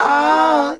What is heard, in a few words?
"ah", Voice